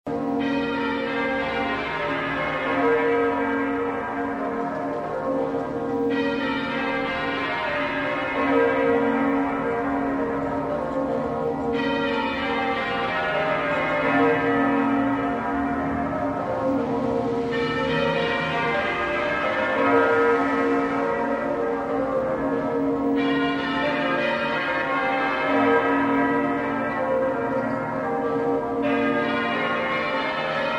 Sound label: back 8 half muffled plain bob triples